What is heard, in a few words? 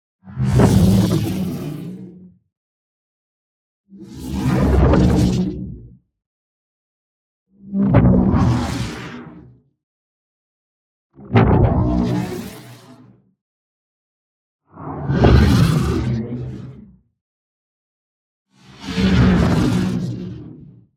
ABILITY DEACTIVATE; Space Sorcery; boom library; Matter